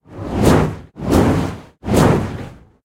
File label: fire Reel Climb Tri Up; willyshotchillies